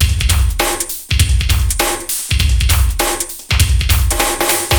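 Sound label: jackhammer 100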